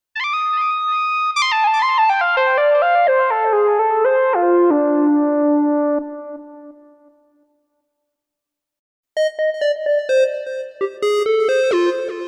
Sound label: Yamaha YC88 Synth Lead